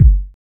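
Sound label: BASS DRUM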